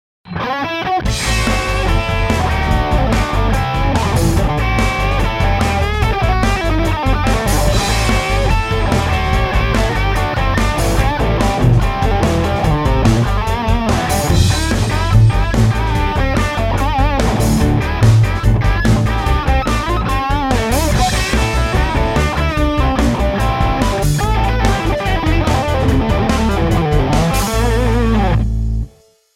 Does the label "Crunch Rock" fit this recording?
Yes